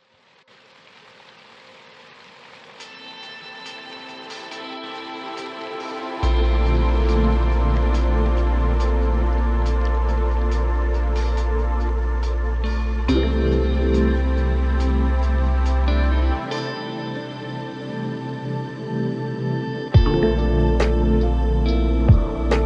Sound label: Deep Ocean Lo-Fi Ambient